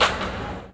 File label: bum; Mafie 1